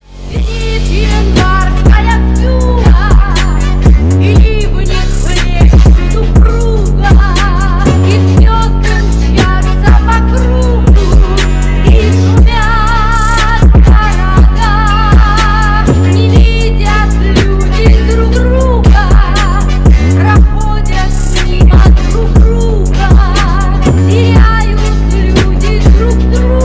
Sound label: trap remix